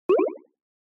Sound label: Accent